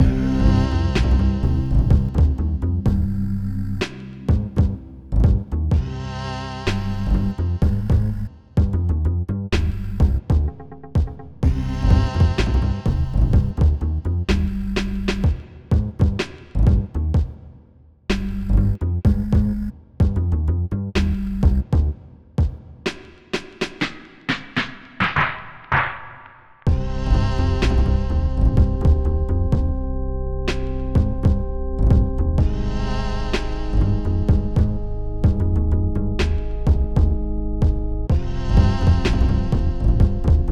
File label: Dungeon Boss Music; The Captives Plot of the Demiurge